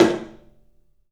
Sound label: TOM, 3D Percussives